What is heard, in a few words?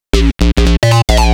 modern y2k square acid, bass